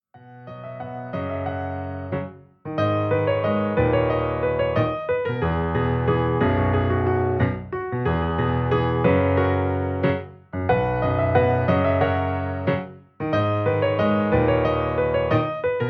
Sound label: piano ringtone